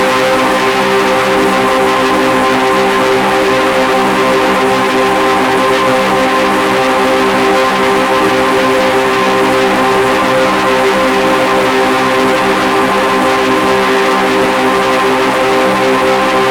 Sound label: Low health Loop; Void Bastards; VB WCG Doom